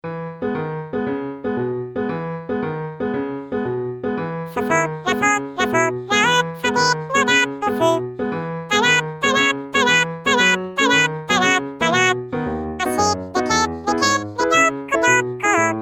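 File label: female alto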